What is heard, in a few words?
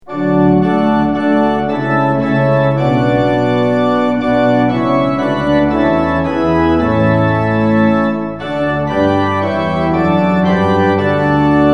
tune; hymns; lillenas